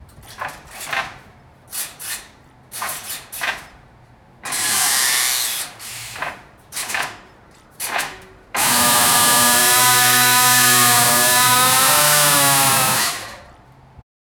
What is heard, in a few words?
manual arc welding